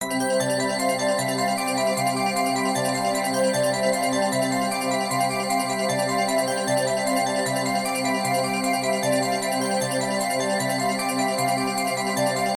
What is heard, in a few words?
count up; Lucky Joker 5